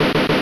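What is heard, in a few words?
background music; 1941 - Counter Attack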